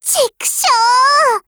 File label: reload intense; m4mod